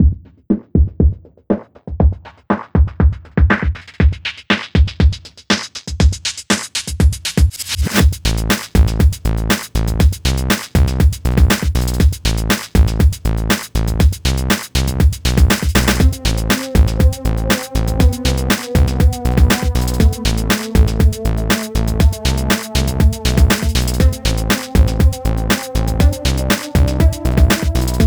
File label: game music regular